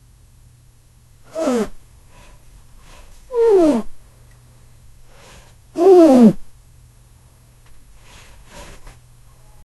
wag wag, domestic animal

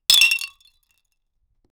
a glass vase crashing; destruction

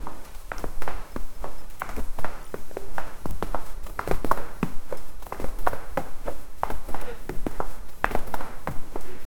walking

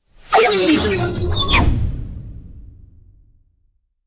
a spherical energy shield

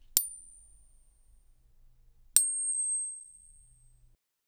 a coin flip sound; finance

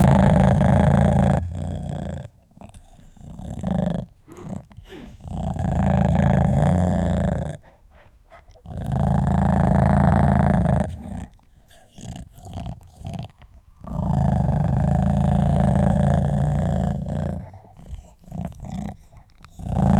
dog growling

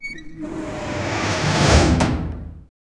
big mechanical pneumatic, doors

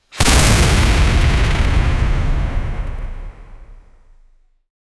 fantasy fireball spell, arcane energy

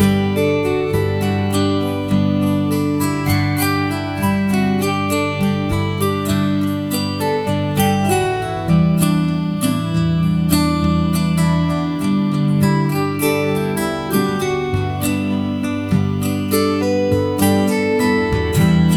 warm folk music with acou; acoustic

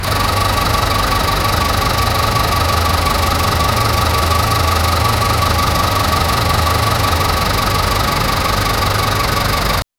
truck motor idling

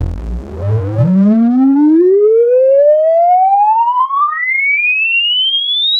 lane departure warning cr, warning

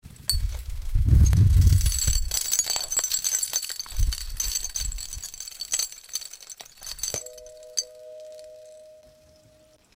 sprinting on slightly cra

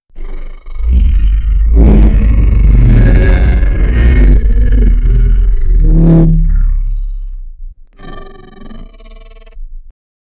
the sound of an old, mechanism

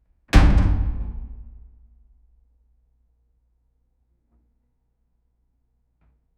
cold ambient echo fridge; door slam

